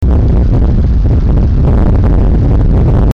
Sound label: Rumble; Myst